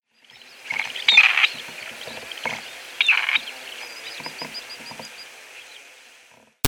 Gray Partridge

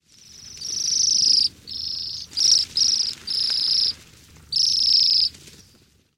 Bohemian Waxwing

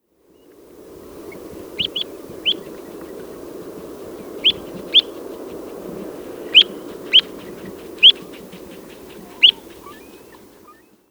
Sharp-tailed Sandpiper

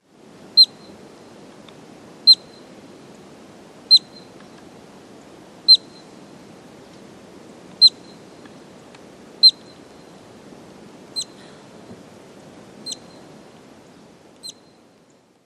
Columbian Ground Squirrel

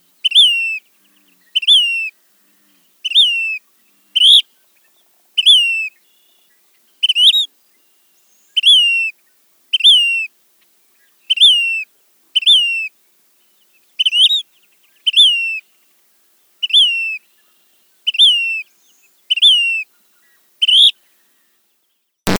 Say's Phoebe

